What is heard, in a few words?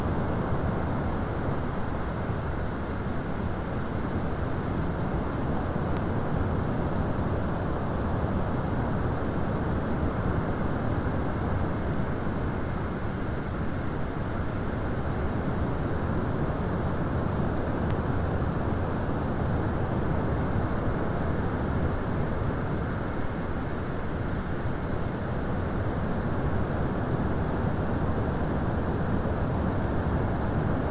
WFR audio emfisis